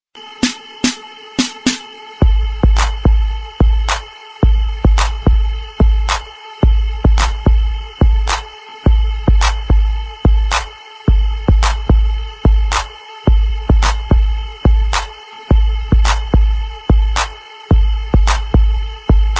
Beat

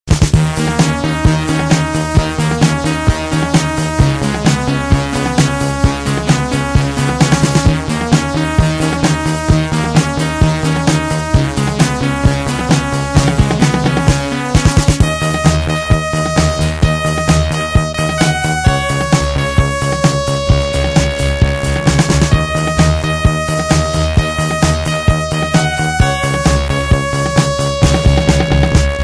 Exceptional Ringing

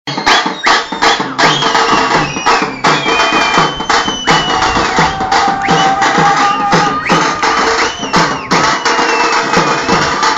South Indian Kuthu

